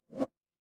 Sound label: ui swipe